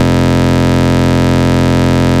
Root F, Bass

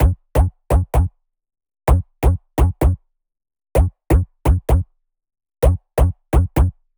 Melody Kits 128BPM Root F#; Vengeance Samples

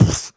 Beatbox Snare, Human Beatbox

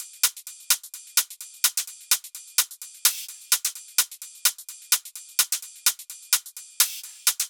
128BPM Brazil Kit; Vengeance Samples

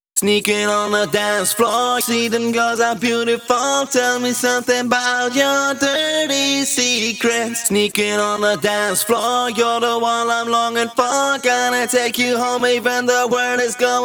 Pitched Vocals WET, 128 BPM; Vengeance Samples; Vocal-Hook Kits; Song Kit

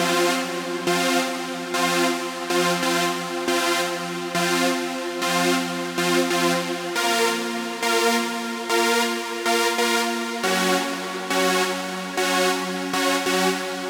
Synthline, 138BPM, F major; Melody & Synth; Vengeance Samples; Another Day Kit

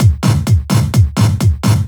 VFX2 Loop 128 BPM

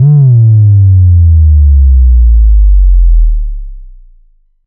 FX Impact; Vengeance Samples

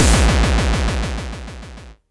FX Scratching; Vengeance Samples

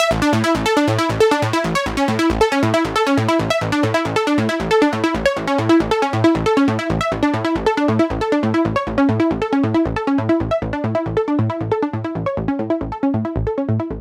137BPM Main pluck DRY, Amajor; Unlooped

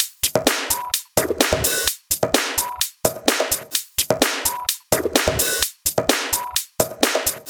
128BPM Fragile Kit; Vengeance Samples

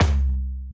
Kick, Trap

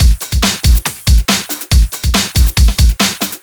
Loops BB 140, BreakBeats; Vengeance Samples

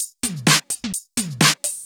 Electro Loop 128 BPM; Vengeance Samples

